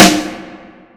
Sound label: hard echo snare; Drum Hits